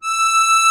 AKORDEON E 4, ACCORDION

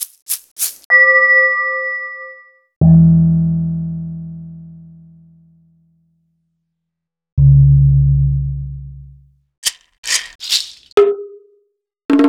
Roland BK-5 Percussion

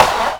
PERC FX, Dance Drums

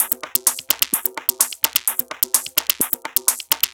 Loops 128BPM, Drumloops

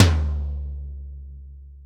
TOM TOM, ACOUSTIC KIT; Greytsounds VOL-1

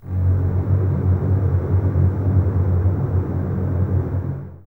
F#1, Basses Tremolo, String Ensembles; Miroslav Vitous; 90 s Sample CDs

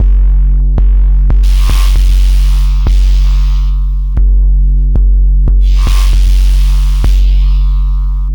Synth Ultra Bass

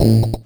Crushed Perc, bitcrushed; musicradar

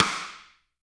STICK, Drum 1 Dry; East Collexion

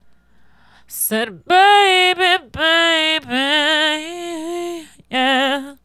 Female Solo, Hip-Hop and RnB Vocals

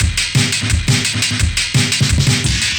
Amen, breaks; Music2000

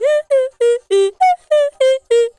Female Vocal 100bpm G Dry, African Voices; Quazibeat; African Secret

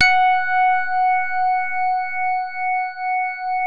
JAZZ MID F#4, E-PIANO; Music Store

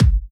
Kicks, Elektron MachineDrum SPS1 MKII

